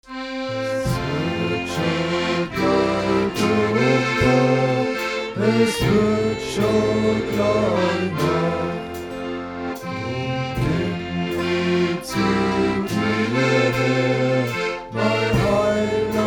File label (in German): Gesang; Adventsfenster; hilpoltstein